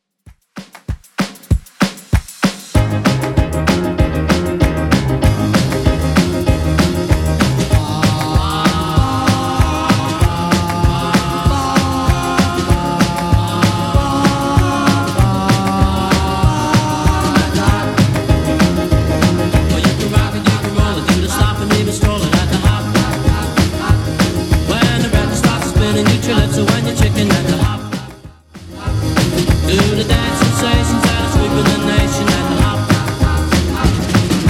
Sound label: 50s Redrum 193bpm